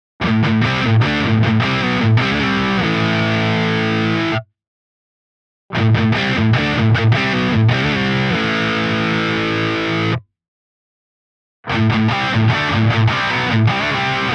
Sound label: crunch full Humbucker HB3, pickup; panico pickup hb3 humbucker